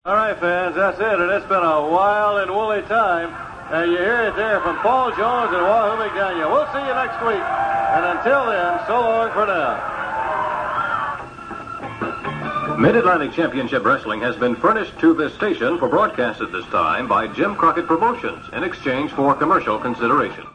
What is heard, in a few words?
close credits